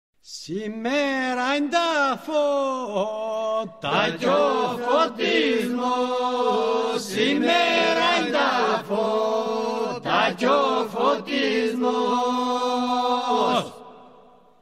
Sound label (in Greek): Κάλαντα Φώτων Δερβιτσάνης Βορείου Ηπείρου